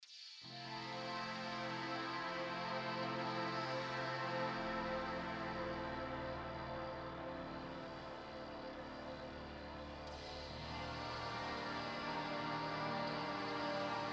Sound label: Dark and Buzzy